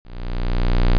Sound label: squiggle monster loop; CyberNation